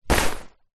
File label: jacket safety thick fluro hi vis pick up; zapsplat; foley